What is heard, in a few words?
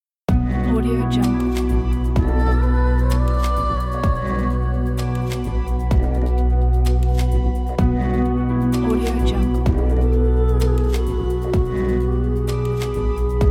atmospheric vocal idents